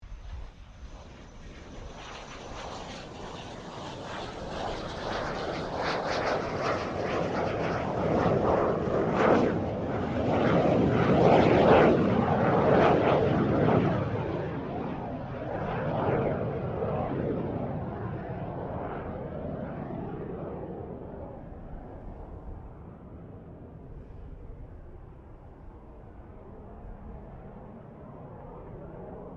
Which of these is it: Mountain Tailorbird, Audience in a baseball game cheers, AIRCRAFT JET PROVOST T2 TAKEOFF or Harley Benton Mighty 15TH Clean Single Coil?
AIRCRAFT JET PROVOST T2 TAKEOFF